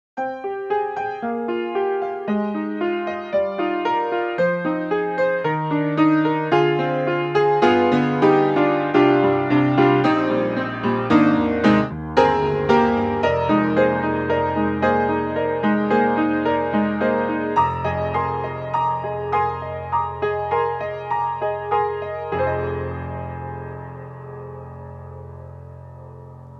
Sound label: Keys Modern Upright Your Upright; XLN Audio; Addictive Keys